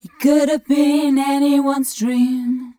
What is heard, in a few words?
f mel d, fem Vocals